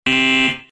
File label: wrong; undp game